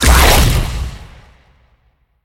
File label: fire attack; smite; Ares